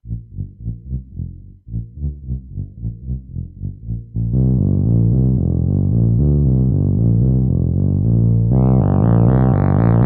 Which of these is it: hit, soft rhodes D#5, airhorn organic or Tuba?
Tuba